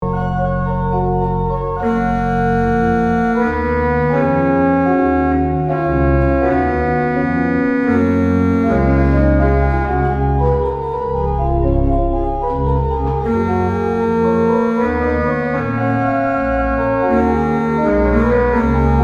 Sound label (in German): orgel; Wohl mir; Sennheiser MKH40; microphone test directional mics